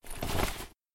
backpack; pond5

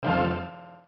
combo; Puffer Popper

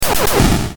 my bomb

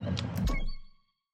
push click; CrossCode

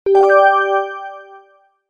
happy alert

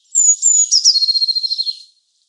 bird twirl; easter

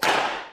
wack a mole; 2fast2curious

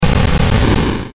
boss bomb

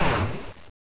low crash, mad cars

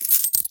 gold up; Skyrim Special Edition

